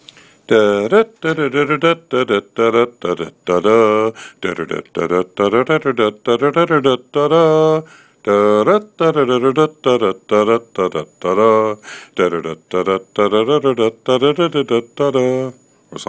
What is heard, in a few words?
unknown melody